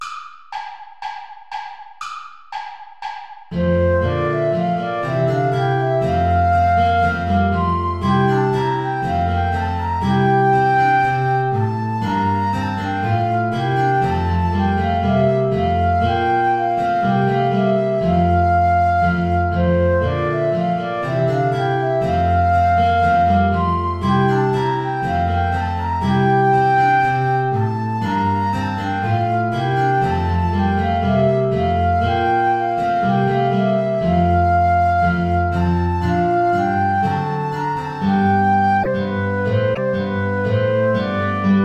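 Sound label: bourrée play along without guitar; praetorius michael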